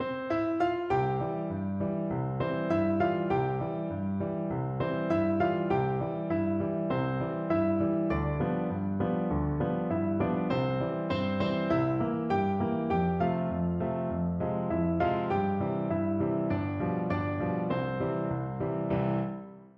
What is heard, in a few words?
when the saints piano duet